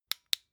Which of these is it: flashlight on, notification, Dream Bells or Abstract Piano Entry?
flashlight on